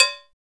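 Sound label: LATIN COWBELL; COWBELLS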